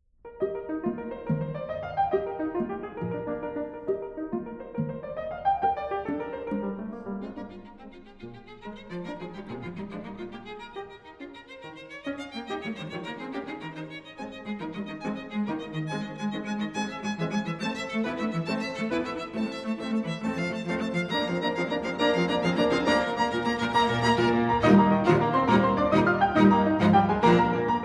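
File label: piano trio; lenormand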